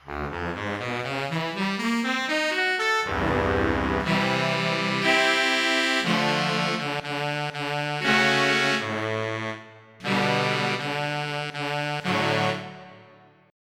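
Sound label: Duet, tenor sax; SoundFont - Traditional, Voice & Keys - Volume 2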